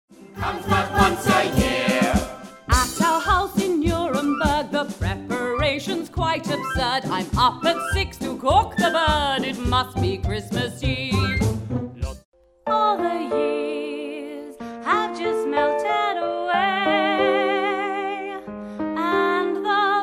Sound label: Singing